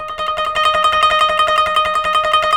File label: KOTO TREM